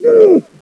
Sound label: pain, player helmet; sven; bts rc